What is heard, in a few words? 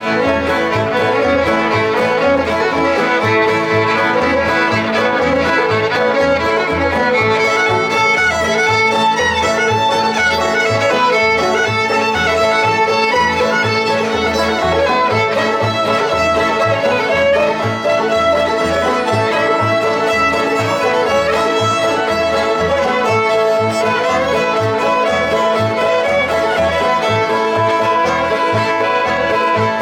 musicbox